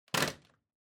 Vacuum Cleaner Head Put

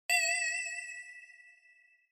Inspiration Single High; Onoma

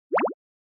Anime Motion, Mid

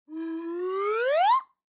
Slide Whistle Long Up

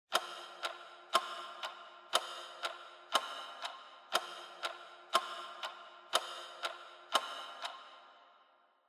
Clock Second Hand Reverb

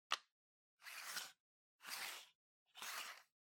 Pendant Light Bulb Insert

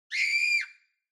Horror Scream Dry

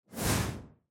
Camera Motion Short

